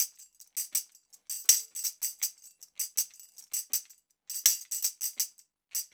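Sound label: TAMB, tambourine; Drumdrops In Dub VOL-1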